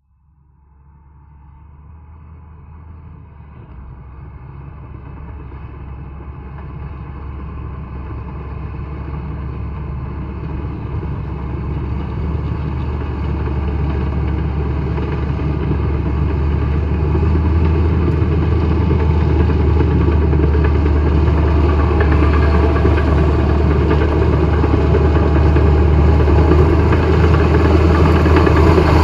Bulldozer; In, By; Bulldozer; Slow In / By / Away, Repetitive Metallic Clanking, Distant To Close Perspective.